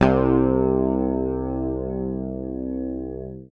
PICK, Bass Library

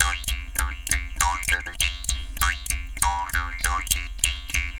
JAW GROOVES, Didgeridoo

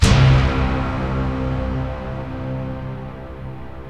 SYNTH FX

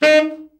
BRA TENOR SN, SAX

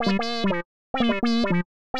120 Soft lead lick C, Keys, Nu Jazz House; PowerFX

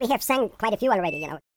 YODEL YELL, World Voices; ILIO; Vocal Planet VOL-5

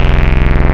Resonant Chord, wavetables; Animoog

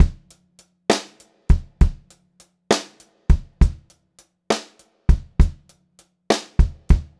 Pop-Rock Drumloops, 100 CLASSI; USB Soundscan vol.37; AKAI; 90 s Sample CDs

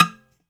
BOUZ PIZZ E 1

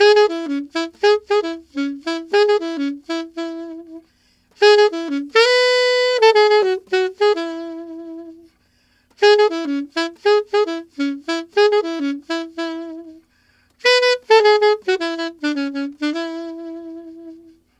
Alto Latin in A maj, Sax; Sonic Foundry (Sony Creative Software)

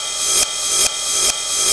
percussion loops, Techno; PS-45

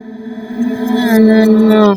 REVERS FX, REVERSE FX, Funky Vocals; USB Soundscan vol.18; AKAI

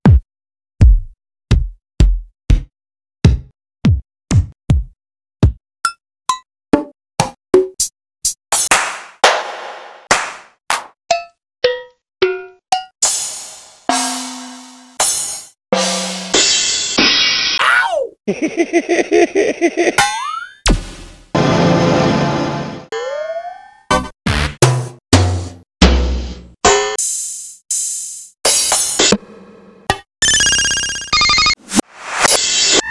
Roland D-2 Roland D2 Kit

